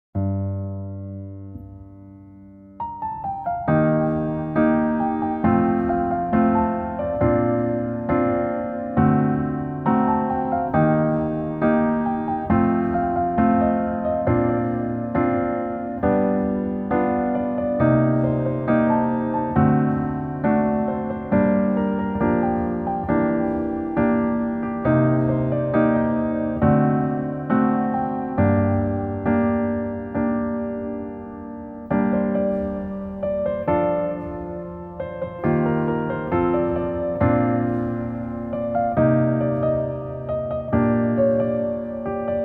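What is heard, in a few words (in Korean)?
Piano version 멜로디 MR 여자키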